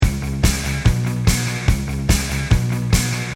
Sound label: backing original